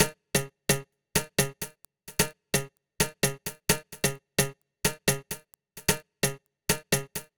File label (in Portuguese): BEAT BERIMBAU